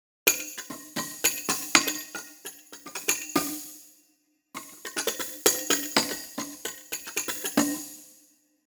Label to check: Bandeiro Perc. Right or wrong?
Right